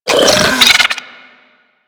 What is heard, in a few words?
Infested Biped Alert; Warframe; Moa